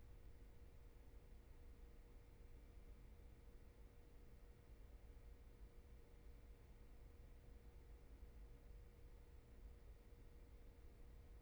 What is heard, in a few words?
room tone